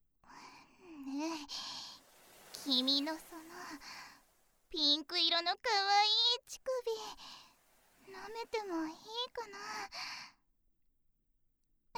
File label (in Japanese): いっぱい ぺろぺろ